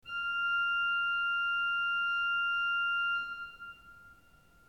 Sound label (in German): ton, orgel